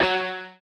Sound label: guitar electric, note; perdachcraft; minecraft